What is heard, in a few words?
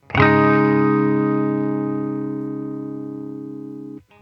Cadd9